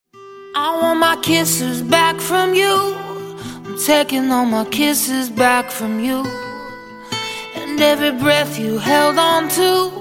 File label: acoustic